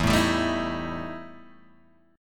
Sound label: E Minor Major 7th Double Flat 5th E Guitar Open G